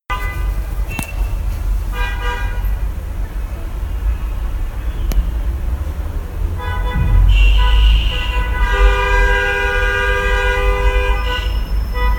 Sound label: traffic audio sample, shenzhen; color run